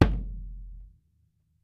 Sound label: SURDO; E-MU Producer Series Vol. 7 – Old World Instruments; World Combos